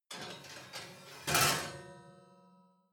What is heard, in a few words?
oven baking sheet draw in soft